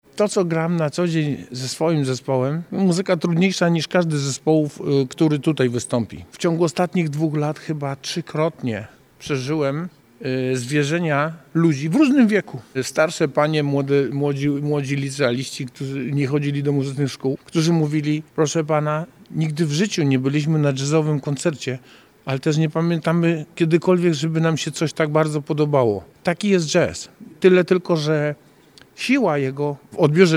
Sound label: jazz; 60 edycja festiwalu jazz nad odra